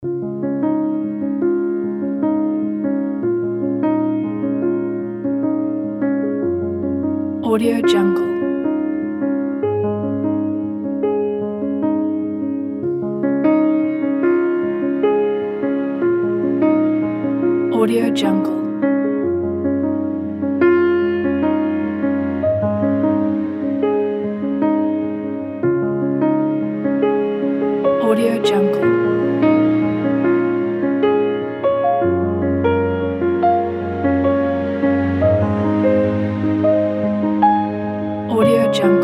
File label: hopeful cinematic piano kit